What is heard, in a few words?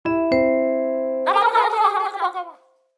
thanksgiving gobble; Google; Nest ringtones